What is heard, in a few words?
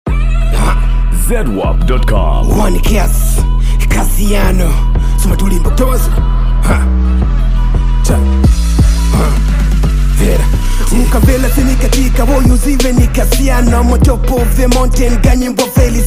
Rapper